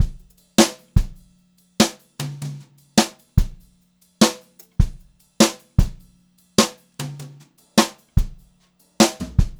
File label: Brushed Drum Loops, JAZZY